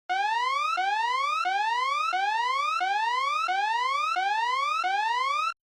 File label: Warning Siren High Long